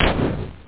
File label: Ship Shoot; Más de 2,500 Juegos; GameRes